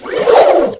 ATTACK

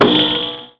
ATTACK

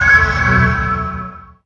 ITEM USE

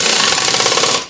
DRILL

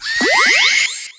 SKILL MAGICIAN SALAMANDRA

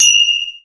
DEFENDER MINUTE GUARD

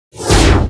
ATTACK

